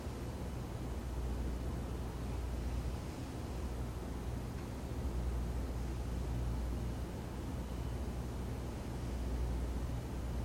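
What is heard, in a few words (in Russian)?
шум работы вентилятора в офисе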